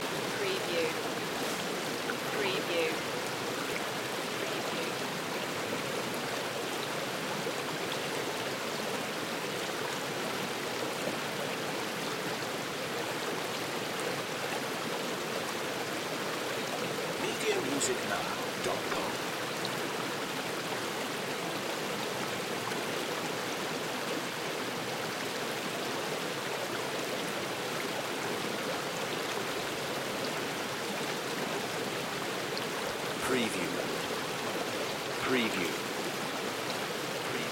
River or Stream Medium

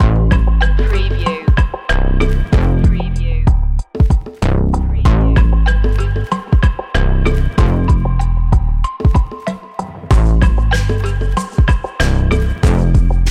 trap loop

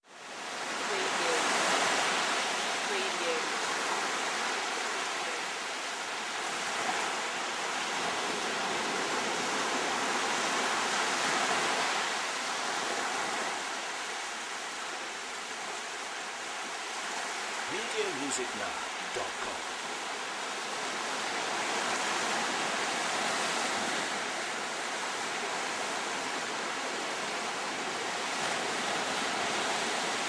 Idyllic Beach Calm Waves

